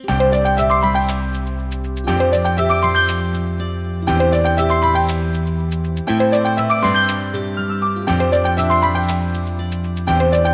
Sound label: ringtones; Meizu; X8; Flyme